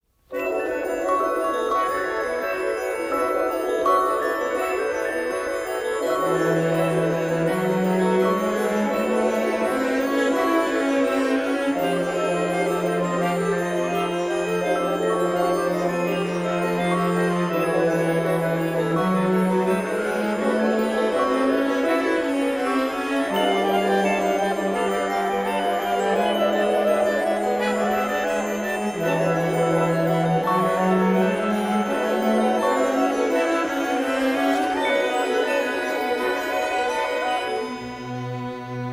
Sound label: Eerie Heavy Echo, soundtrack; Bank Shot; L'osservatore osservato